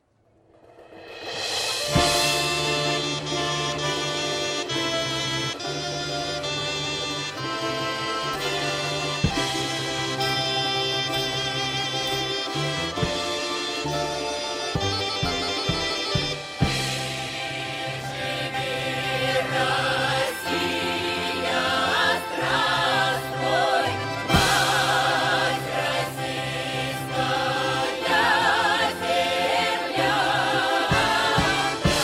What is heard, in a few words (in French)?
russie tambov ensemble